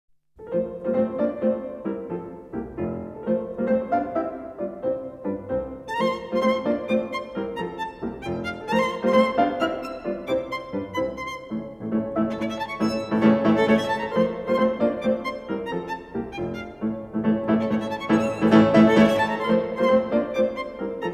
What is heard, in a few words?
violin sonata in F scherzo trio allegro molto; no 5; op 24; spring